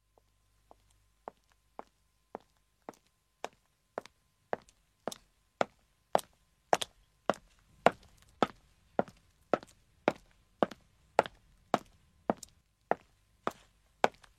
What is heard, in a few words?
Foot Step Men & Women Approaching; human